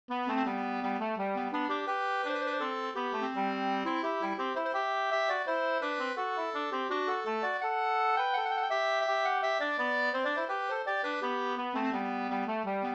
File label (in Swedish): ganglat; Sabb Johns ganglat